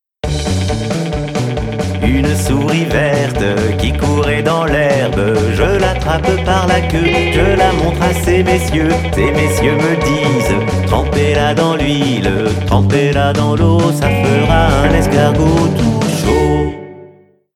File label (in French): comptine rock